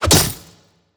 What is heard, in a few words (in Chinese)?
重攻击命中